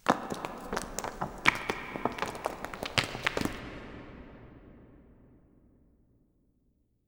Rocks; Inquisitor 3

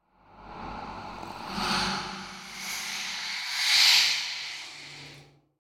Air Scream - Short Pass By Zombie - Short Hover; Hollywood Edge; sound designer's toolkit v2

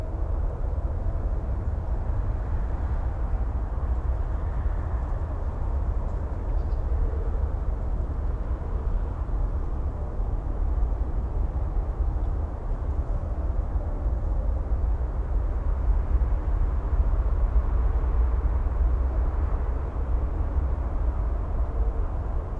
Urban din day traffic rumble; urban and suburban; boom library

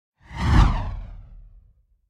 Fire Ball Passing Flying Fire Ball; Hollywood Edge; sound designer's toolkit v2

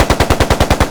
Assault Rifle Shot Loop; SFX Kit; Weapons

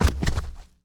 Bodyfall Grass; SFX Kit; Bodyfalls

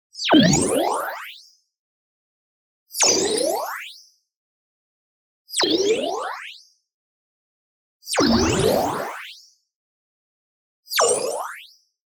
tonal, filter delay modulation; boom library; Anime Essentials

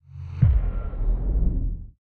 Focus Enter; Pitch Black - A Detective's Demise

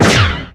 Blast Release Short; Apotheorasis

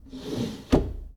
Foosball Blocker Slide

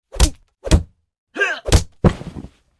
Punch-Body Fall Combo With Vocals; Hollywood Edge; Martial Arts and Human Impacts

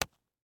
Face Mask, Medical; Blastwave

